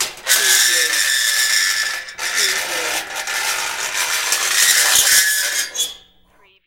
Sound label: Metal Screech Intense High Pitch